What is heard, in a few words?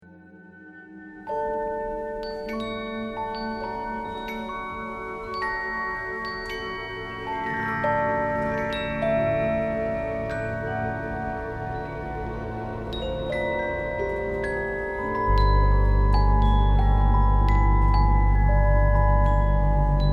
carillon; johannes motschmann